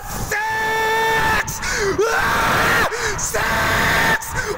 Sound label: funny japanese man yelling